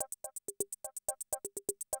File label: CR78 125, drum loops